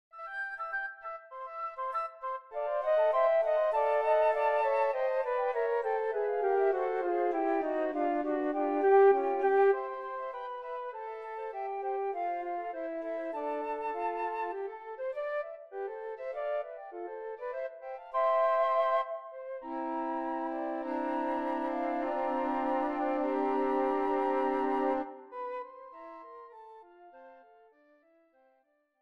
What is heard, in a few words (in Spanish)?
flauta